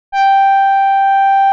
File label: Moog